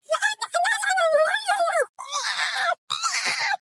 shouting; bloon

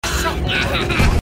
nyahahaha

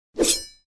slashing sound

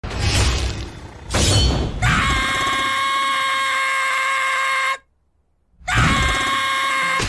frustrated scream

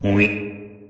mweep reverb

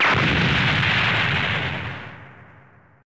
anime explosion

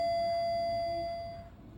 front door

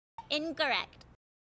incorrect power

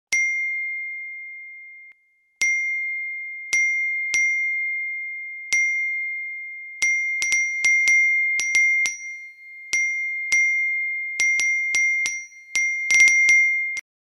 notifications

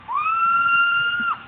haaaa

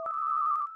enemy try psi

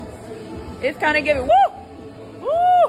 woo

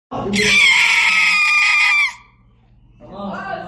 chicken screach

